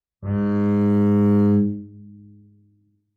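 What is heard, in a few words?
Bass arco fortissimo sul D Ab2, Double Bass; MIS Pitches - 2014; Strings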